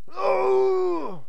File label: Pain, soldier; hellenes